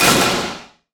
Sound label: WrkShop Drop Nmbr Plt Stack; Prison Architect